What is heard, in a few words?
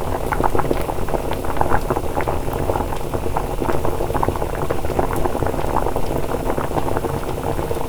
oven cooking; firefight